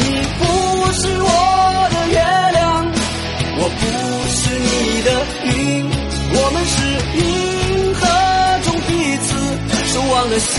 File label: Chinese Ringtones